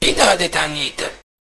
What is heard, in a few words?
jingle